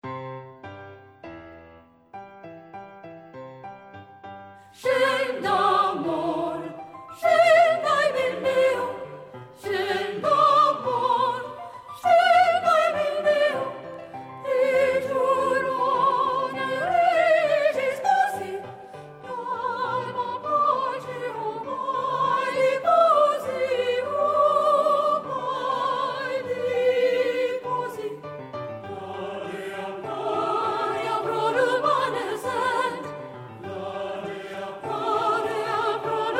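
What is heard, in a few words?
soprano; Scenda amor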